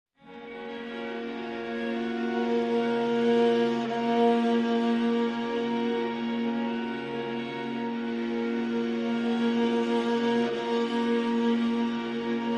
Strings Only

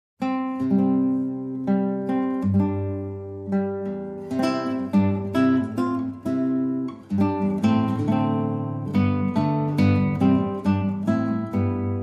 Solo Guitar

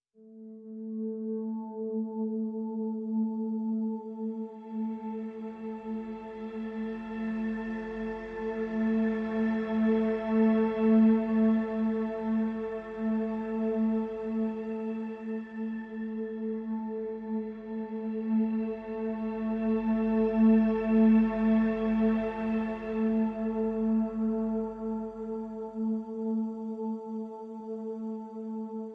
Pads Only

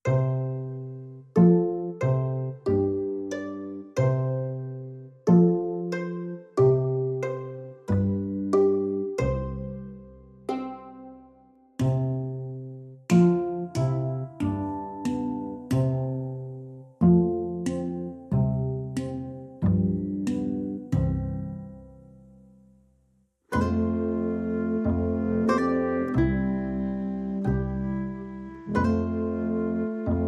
No Lead Guitar

